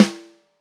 Snare Rimshot Hard

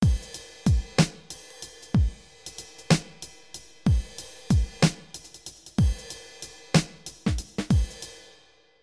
Beat

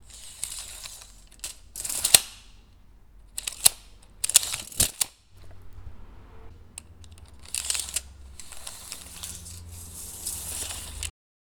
Measuring Tape

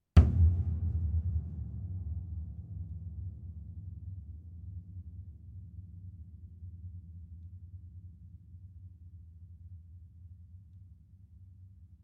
Piano Hits Hand

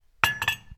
Cup On Sauce

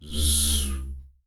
Vocalised Buzzy Whoosh

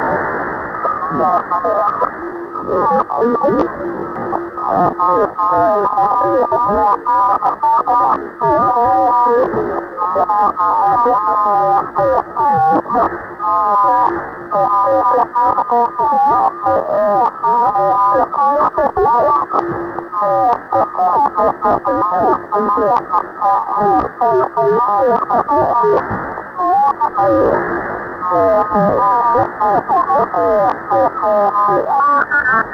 Alien Radio Message

